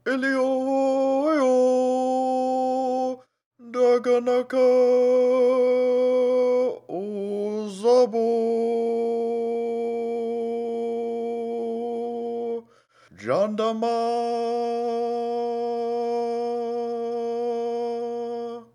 Reed sing; Hollow Knight: Silksong

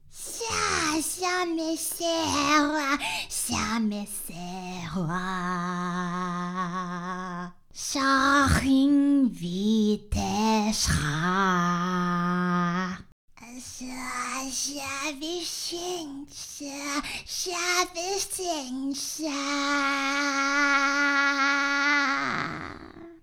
Scrounge sing

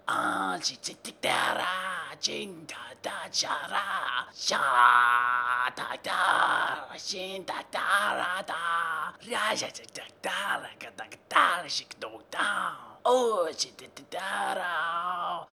sing; Hollow Knight: Silksong

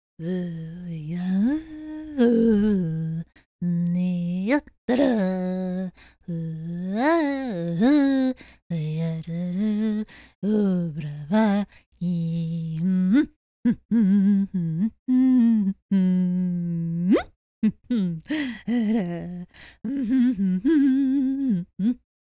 Hum

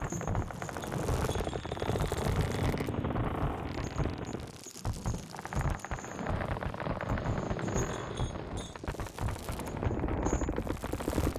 house exterior atmos loop; Hollow Knight: Silksong; umbrella

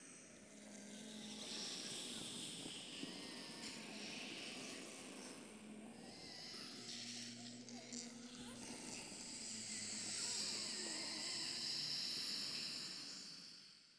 fungal wastes atmos loop; Hollow Knight: Silksong

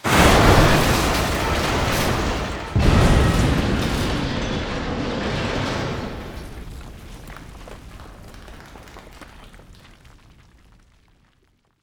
lift final drop; understore

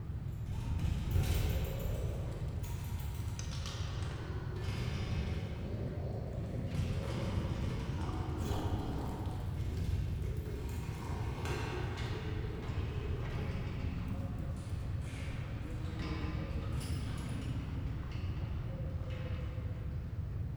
atmos arborium; Hollow Knight: Silksong

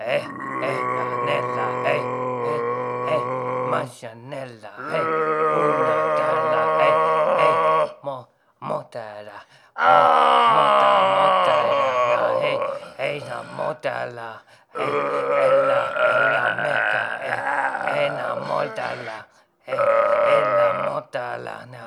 sing loop; Hollow Knight: Silksong

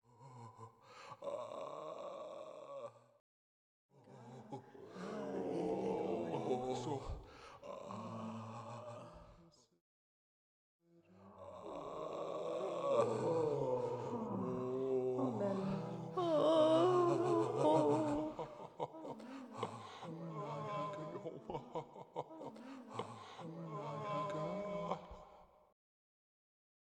pilgrim crowd; act3; Hollow Knight: Silksong

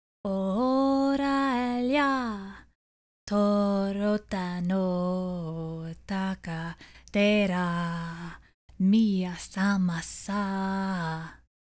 Pilgrim Female Sing

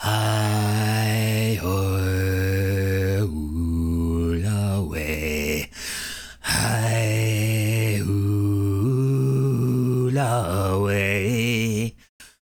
Male Enemy Song; Hollow Knight: Silksong

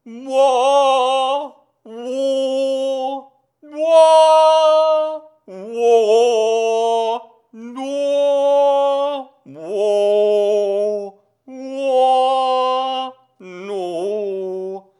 TT sing; Hollow Knight: Silksong

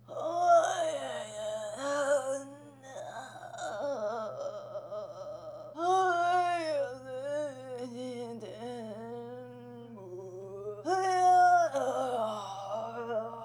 farmer centipede sing; Hollow Knight: Silksong

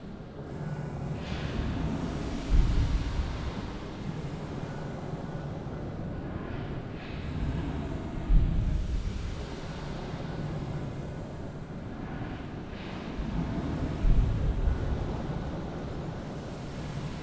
atmos organ interior

